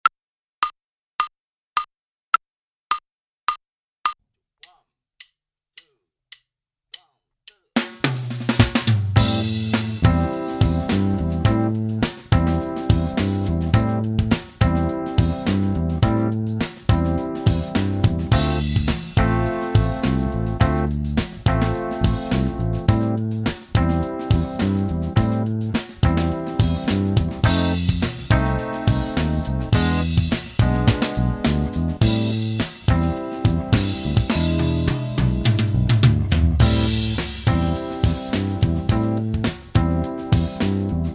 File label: Minus Guitar; Inst Ensemble